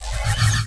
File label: spell power shot cast; newbie